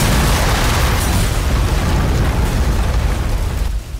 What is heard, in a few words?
ship crash